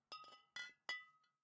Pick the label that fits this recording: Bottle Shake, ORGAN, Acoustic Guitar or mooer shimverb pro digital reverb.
Bottle Shake